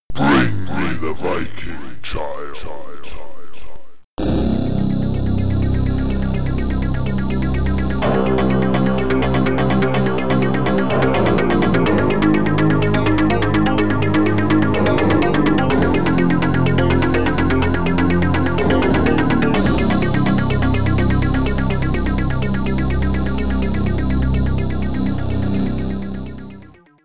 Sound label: Loading Tune; Prophecy I: The Viking Child; amiga